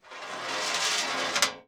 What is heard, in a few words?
Coin On Metal Tray